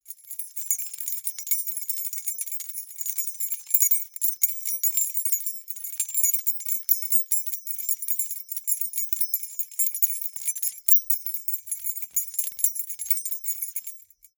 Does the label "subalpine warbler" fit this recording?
No